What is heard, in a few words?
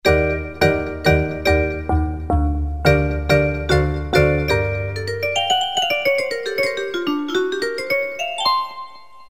Vibes